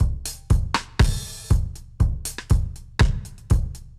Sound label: Dub Drums A Dry 120bpm; musicradar